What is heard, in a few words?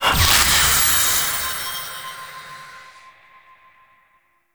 Confetti Burst D, Ambience; Warframe; Duviri; Bombastine Encounter